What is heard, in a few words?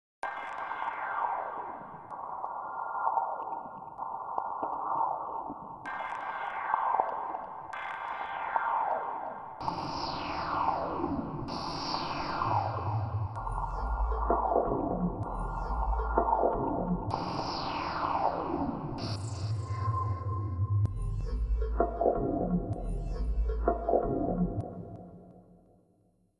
Atmospheric FX; Multitrack; Stem Kit